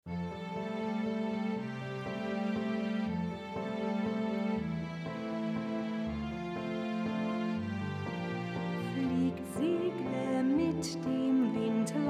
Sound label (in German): Der Papierflieger Alt; musical ag